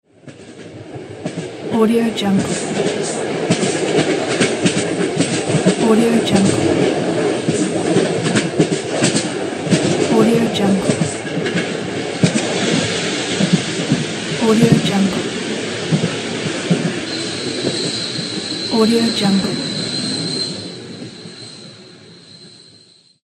train

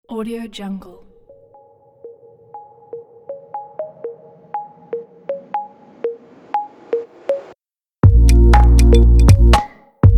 inspiring abstract

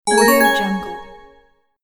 karate game bonus

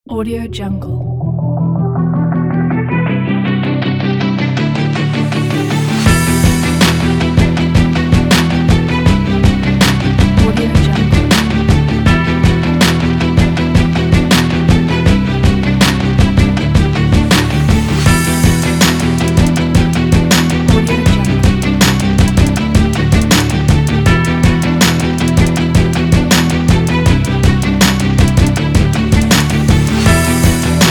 heroic hip hop

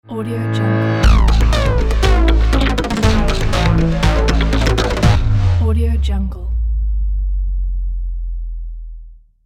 market logo

